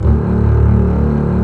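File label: arco basses; E-MU Formula 4000 Series Vol. 5; Protozoa; Proteus2 Presets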